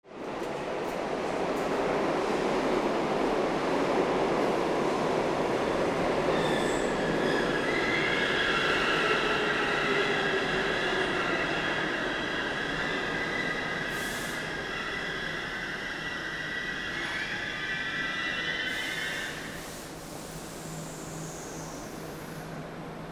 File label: TRAINS